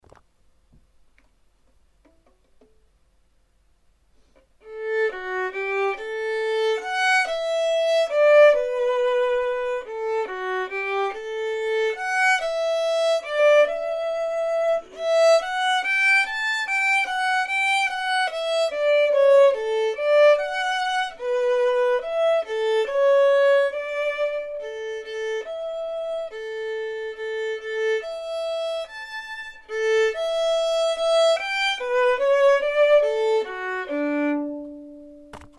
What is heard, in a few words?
vln perf